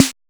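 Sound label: rapper snare; Drums In Your FACE!; HIP-HOP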